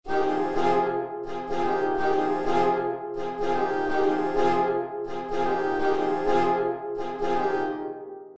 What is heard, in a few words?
Train driving lick